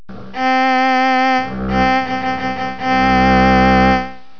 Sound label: CELLO